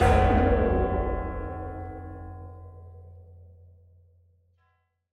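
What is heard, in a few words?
Choir Boy damage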